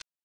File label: Keypress Delete; android